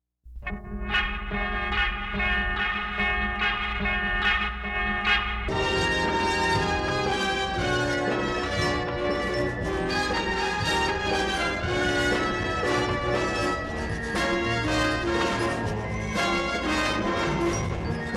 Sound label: promo bed; Todd and Tom NIght Owl Show Promo Bed (1970s)